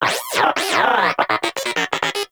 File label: Moa Inquisitive Vocal Player Down; Pets; Warframe